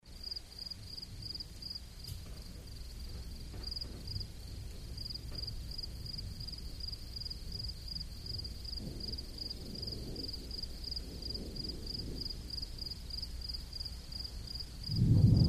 Distant thunder with increasing rain, end of an African drought (some crickets)